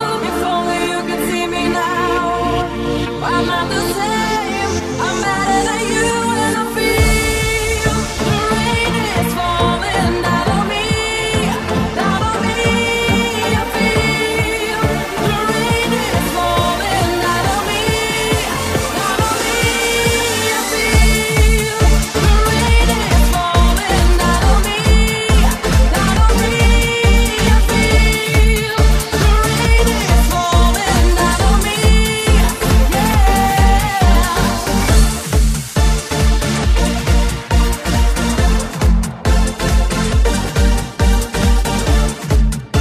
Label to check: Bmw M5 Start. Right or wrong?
Wrong